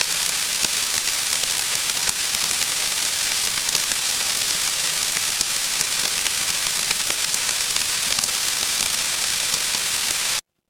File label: ambience rain